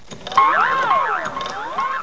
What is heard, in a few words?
body